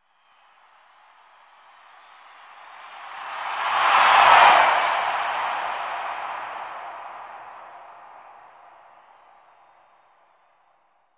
Arena Crowd; Crowd, Huge Cheer With Doppler By And Long Decay.